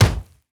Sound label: Limb Blunt; Damage